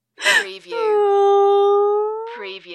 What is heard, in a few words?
Female Sad Sound